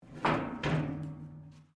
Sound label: metal rusty move; Materials